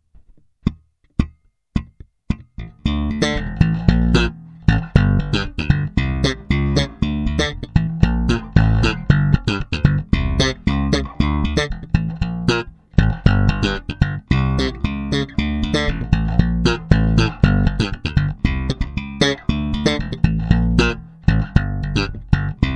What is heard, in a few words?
Yamaha bb std 2